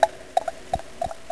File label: Mysterious Popping; Curse of Dragor